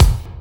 kik, drums; virtual drum sounds

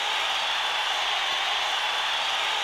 back, live drums; virtual drum sounds

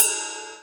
ride, virtual drum sounds

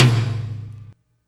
tom; drums; virtual drum sounds

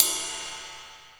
ride, virtual drum sounds

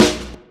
snare 1; virtual drum sounds

